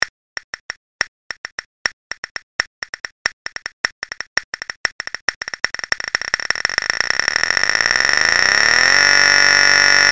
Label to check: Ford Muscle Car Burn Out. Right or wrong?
Wrong